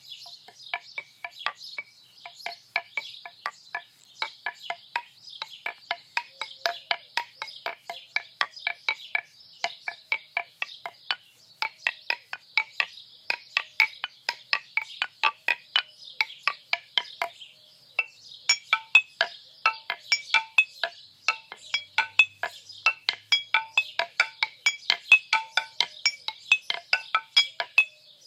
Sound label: lithophone